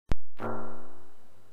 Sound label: low, drum